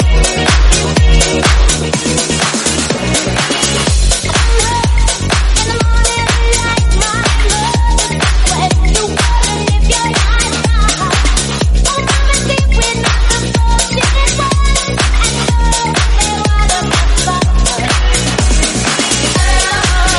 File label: House 124bpm F